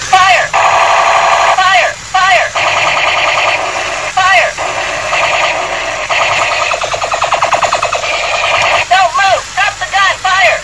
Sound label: fire